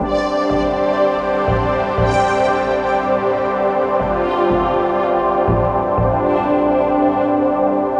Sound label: vibes